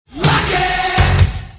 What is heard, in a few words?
rocket